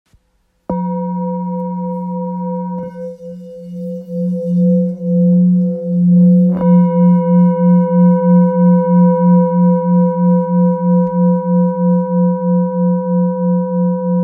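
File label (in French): Bol ancien fa, bols chantants tibétains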